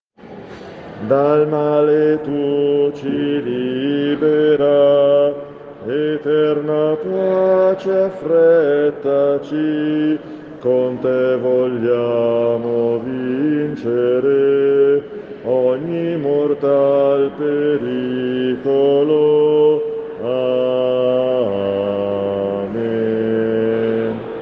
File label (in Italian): Discendi Santo Spitito contralti